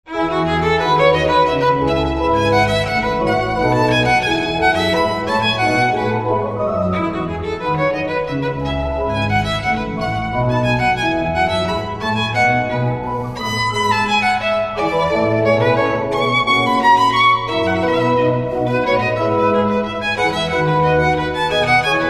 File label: violin organ